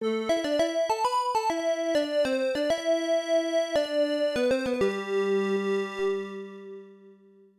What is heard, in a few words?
Soldier's Flute; IBM PC-AT; Dragon Slayer; The Legend of Heroes II